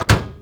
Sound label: Door; New Super Mario Bros. Wii